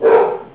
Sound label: Bark